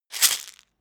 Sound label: pillbox shake